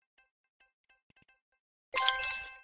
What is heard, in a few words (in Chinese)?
豆浆桶抛物线